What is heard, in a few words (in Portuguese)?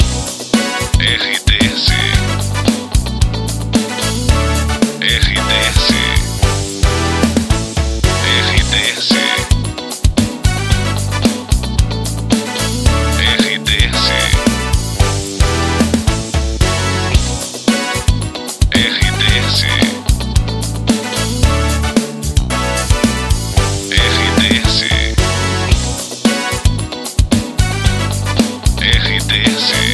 trilha para locução; Pacote Princesa FM, Rodeio/SC. Estação com características popular